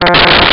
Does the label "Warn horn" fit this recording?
Yes